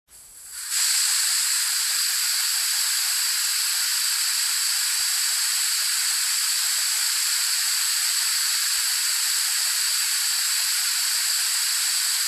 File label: Paint Sprayer Machine